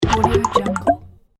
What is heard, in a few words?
confuse